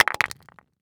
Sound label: Weapon Grenade Stinger Grenade Tossed And Rolled On Cement Short; AdobeCC